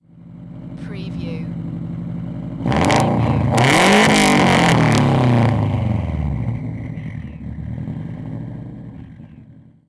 SUBARU STI EXHAUST DOUBLE REV

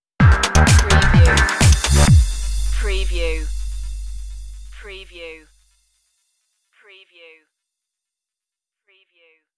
stiliobeat stinger

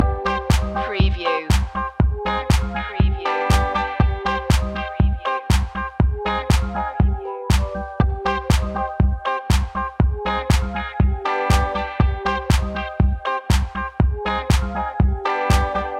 latin dancing loop; media music now

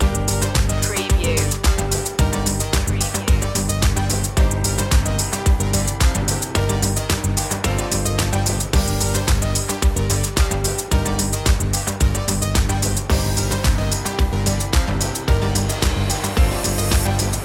corporate machine loop; media music now

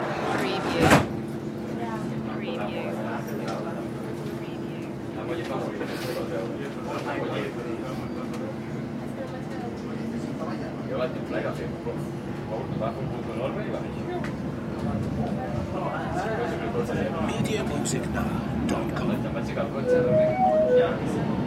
barcelona metro ride loop

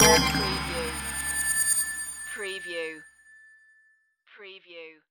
Hit Burst

